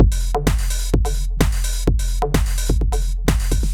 Drum Loops 128bpm Berlin Techno

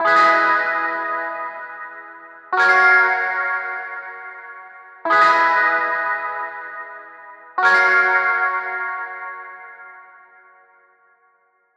Piano 95bpm Ebm Electric Chord Soul

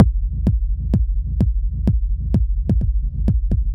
Drum Loops 128bpm Berlin Techno

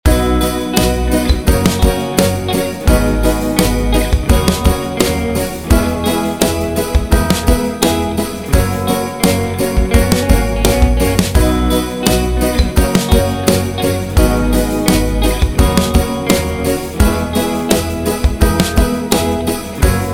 Christmas Melodies

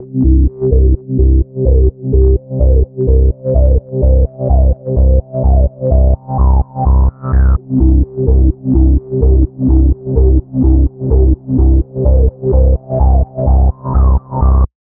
127 Cm Bass

